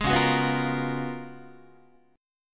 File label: Db 13th Guitar, Db13 chord; strummed; JGuitar